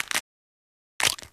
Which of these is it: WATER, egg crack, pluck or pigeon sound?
egg crack